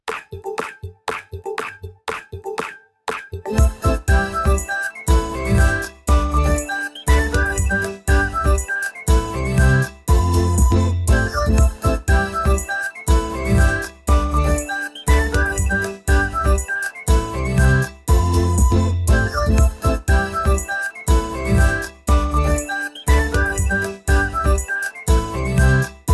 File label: dopamine ukulele